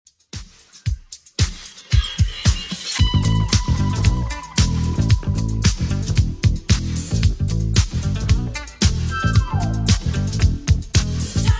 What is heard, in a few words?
80s Redrum 113bpm